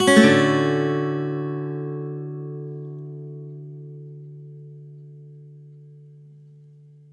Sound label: D maj7 upstroke, Acoustic Guitar; Sonic Foundry (Sony Creative Software); Songwriter Acoustic Guitar Companion; One Shot Chords